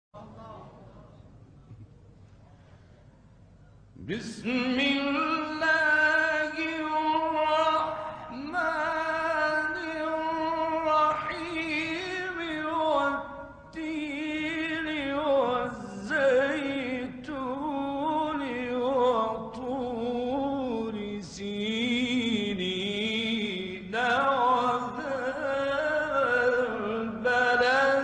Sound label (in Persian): تلاوت زیبای آیات ابتدایی سوره تین; راغب مصطفی غلوش